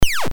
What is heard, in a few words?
BASIC sid MOS6581R3, GAMES; Terrible Twins